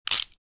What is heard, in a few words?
Ratchet wrench turning fast twist